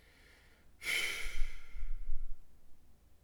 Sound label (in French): soupir; Hadjithomas Joreige